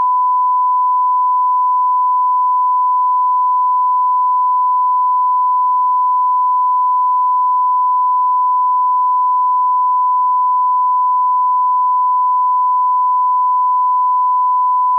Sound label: 1k -9dB PK test